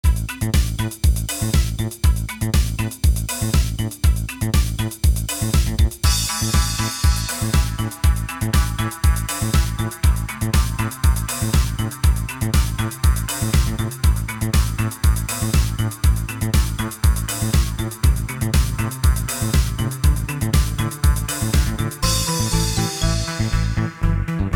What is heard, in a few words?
in the forest